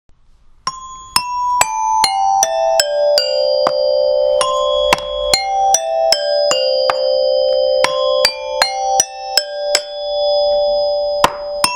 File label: xylophone